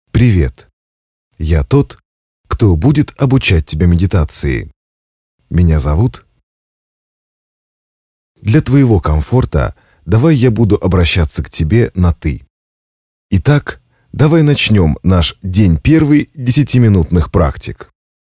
Meditation

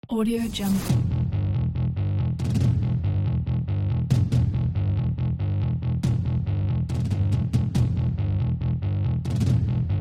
Cinematic action

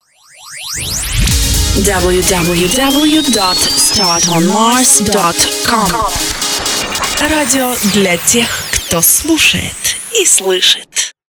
Audio Jingle; PRime

